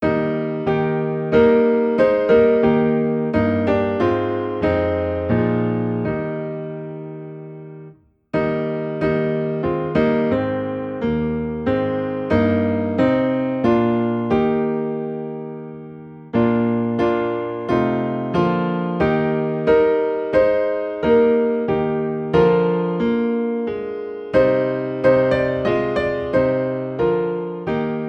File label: Piano in E Flat; Be Thou My Vision